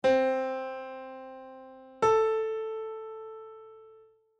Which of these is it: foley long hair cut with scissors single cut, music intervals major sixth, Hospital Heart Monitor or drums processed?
music intervals major sixth